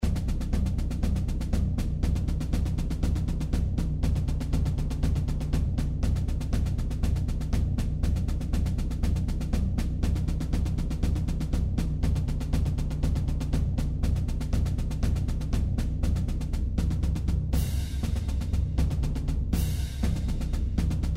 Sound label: cadence; loco